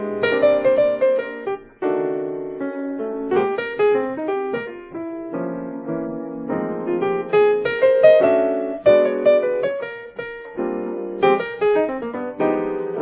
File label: piano